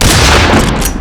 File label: m37 shoot pump